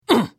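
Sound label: Hit, Khajiit female; The Elder Scrolls III - Morrowind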